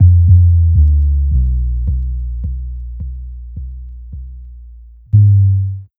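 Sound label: synth bass, drum and bass, 160 bpm, C; eJay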